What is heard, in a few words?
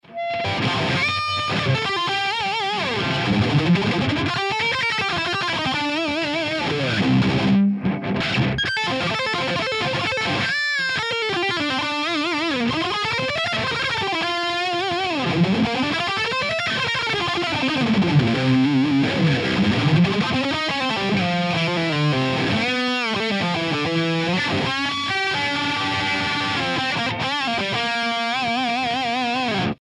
Laney VH100R Solo